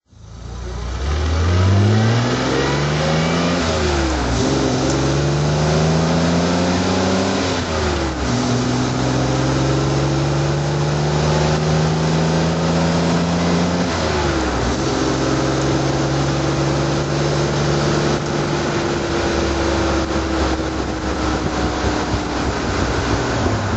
healey3000mk1 drive

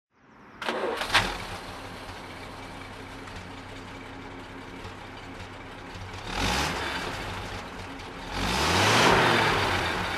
bentley mk6 foursome dhc throttle; Bentley Mk VI Park Ward Foursome Coupe, 1950; Engine sound; V3D/ ClassicarImages; Marc Vorgers

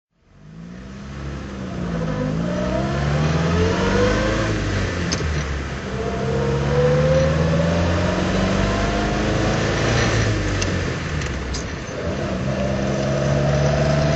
triumph 1800tr drive

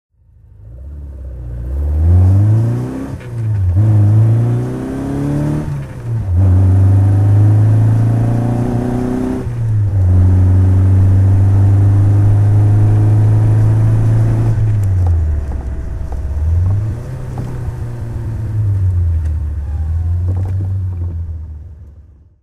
lotus seven driving; Lotus Seven series 2, 1964; Engine sound driving; V3D/ ClassicarImages; Marc Vorgers

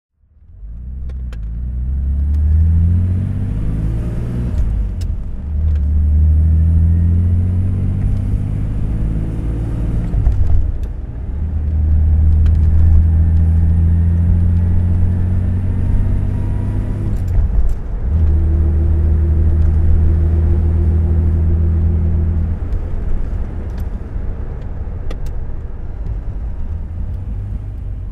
alvis TE21 DHC driving

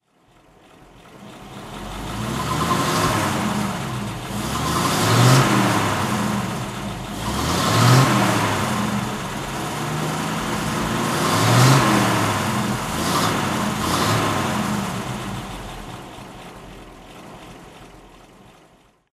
packard 640 throttle; Packard 640 Custom 8 saloon, 1928; Engine sound; V3D/ ClassicarImages; Marc Vorgers

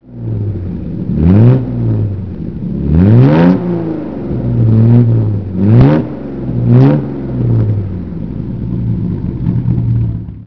healey 3000

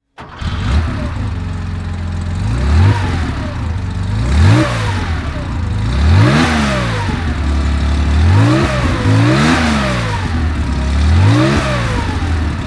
porsche 911 24T throttle

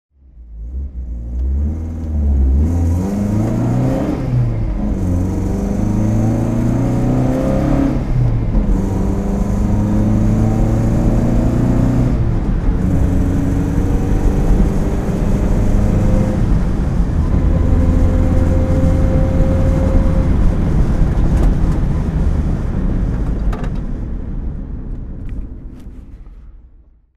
alfa romeo zagato 1600 drive

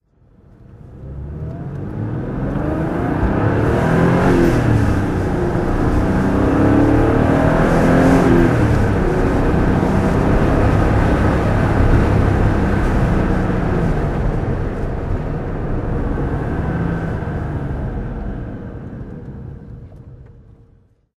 porsche 911 (964) C4 driving

